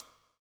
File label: COPS STK; Spectrasonics - Backbeat